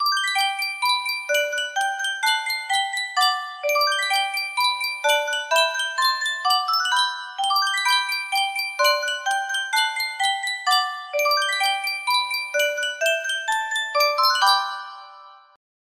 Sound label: Yunsheng Spieluhr, music box melody; mozart